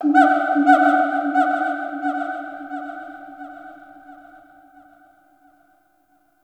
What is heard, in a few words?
Forest Distant Misc Animal; Warframe; Grineer